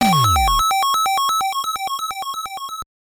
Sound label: Lose Life; Fantasy Zone; Tengen; NES